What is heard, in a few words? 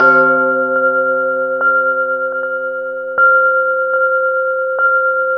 gong along, synth bells; Zero-G Ambient 1